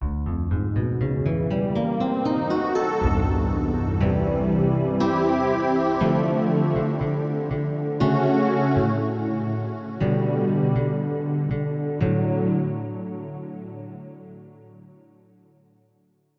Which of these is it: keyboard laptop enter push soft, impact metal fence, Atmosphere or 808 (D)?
Atmosphere